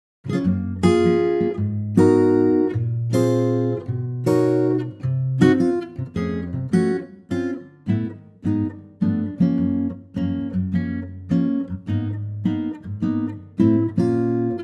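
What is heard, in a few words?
Gb acoustic guitar; key - Gb - vocal range - (optional Gb) Ab to Db; An intimate acoustic guitar arrangement; An intimate acoustic guitar arrangement of this lesser known standard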